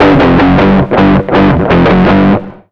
160 H ROCK, Electric & Acoustic Guitar Loops; USB Soundscan vol.04; AKAI

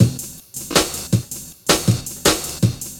FUTURIST DRUM N BASS; Sonic Foundry (Sony Creative Software); Not Just Another Pretty Bass

